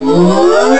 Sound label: Shield Charge